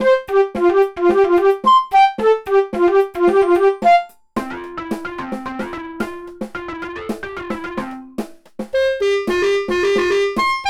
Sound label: silly success fanfare long